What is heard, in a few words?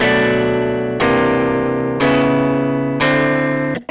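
guitar chords, Hybrid neural guitar overdrive; zendrive; Morphdrive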